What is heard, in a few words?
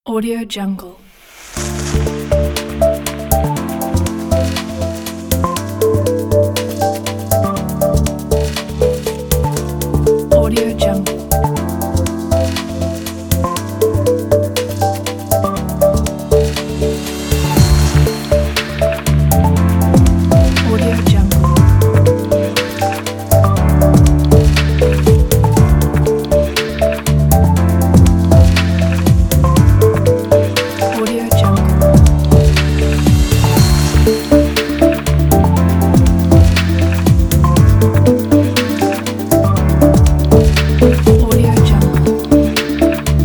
business technology